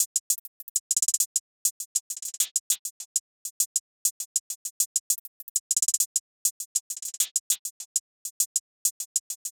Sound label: Drum Loop, 100 BPM Hihat, RnB; Loop Stems; Cymatics; GHOST (Beta Pack); Atrophy Drum Loop